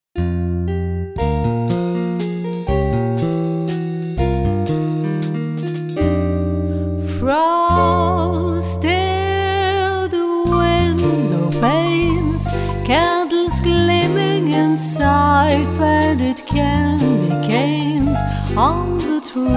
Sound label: Christmas waltz